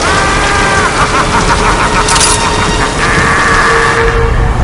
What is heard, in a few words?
laughing; freak fortress 2; freddy